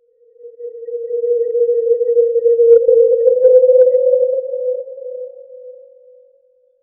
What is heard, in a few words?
eerie low pitched ghost, ghost